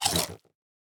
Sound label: strider eat